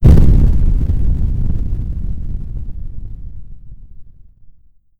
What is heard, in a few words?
small explosion; arms